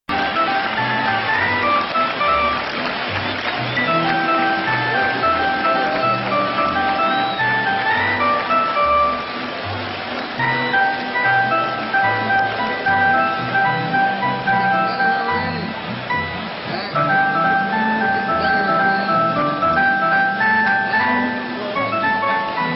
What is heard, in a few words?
music bed; sounder